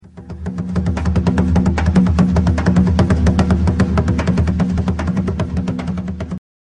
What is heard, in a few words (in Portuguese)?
tantã